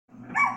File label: Dog Bork